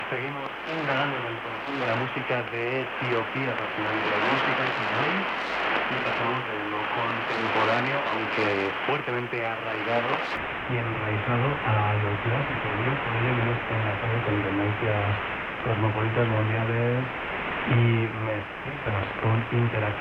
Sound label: 21610khz AM; Radio Exterior de Espania